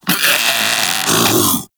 Vocal Death, Infested; Splitter Split Top; Grey Strain

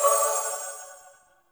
Choir Bow Charge Full; Warframe

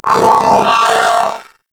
Zanuka Companion Vocal Alert; Pets; Warframe

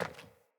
Sandals Walk Metal

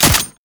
Alt Fire Mech; Weapons; Warframe; Tenno; Oraxia Flechette

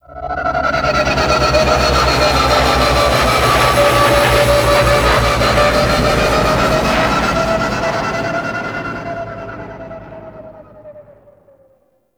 Drop Off, Flight; Vehicles; Warframe; Liset; Nora Liset

